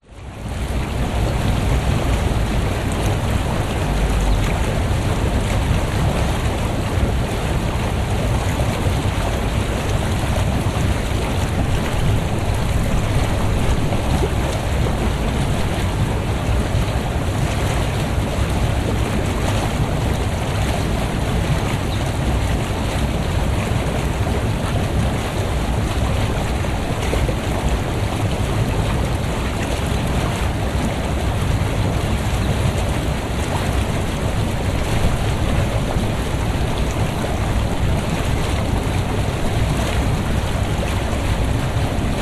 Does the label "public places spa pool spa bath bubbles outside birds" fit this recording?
Yes